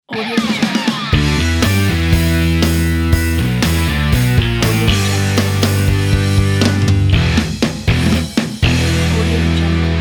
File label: classic rock logo pack